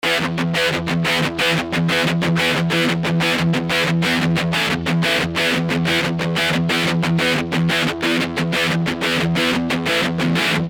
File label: Stereo El Guitar After Heavy